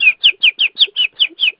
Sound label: chirp